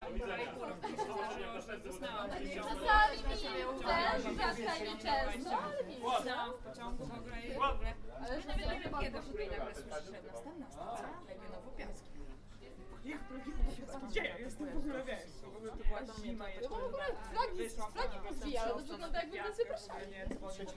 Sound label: Talking People